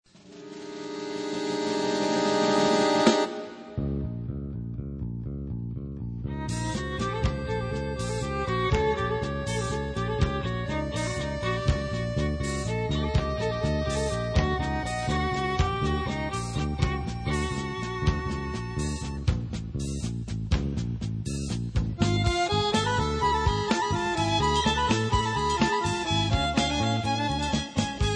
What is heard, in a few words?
Arabian song